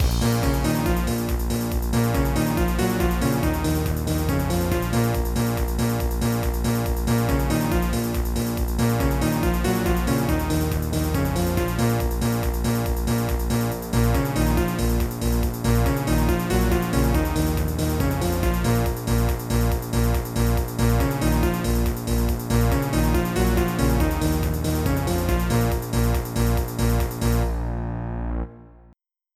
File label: techno custom, ringtones; MIDI Music File